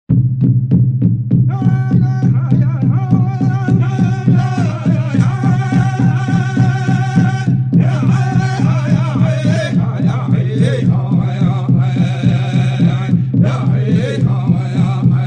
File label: Kiowa Fast War Dance